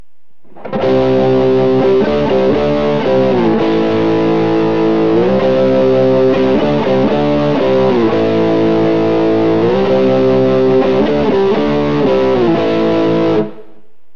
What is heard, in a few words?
neck crunch; dragon; Burny